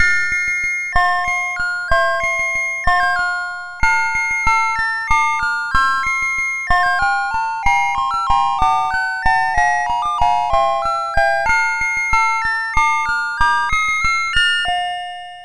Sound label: sid MOS6581R4, GAMES; Chinese Juggler